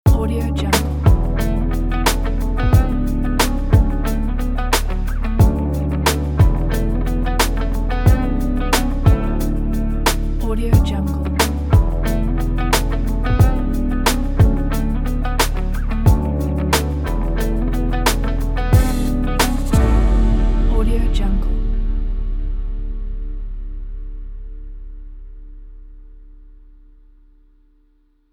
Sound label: uplifting hip hop corporate intro